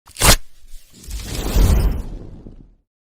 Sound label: rip full